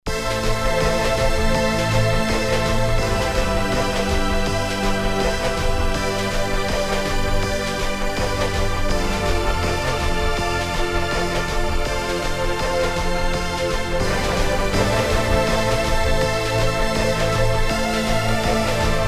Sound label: seq pad, Alesis qsr synth